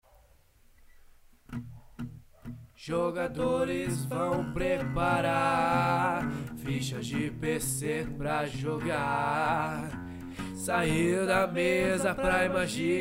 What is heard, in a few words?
Intro Blues; Tarrasque na Bota